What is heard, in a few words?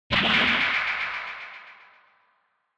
Retro Anime Robot Low Long